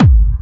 Soft, kicks

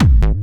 Kick n Bass

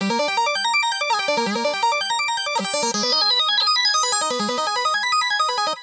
165 bpm

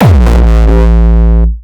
Nu Style Kick, Kickdrums

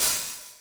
CYMBAL, Yamaha RM 50

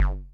kicks, Bass Drum

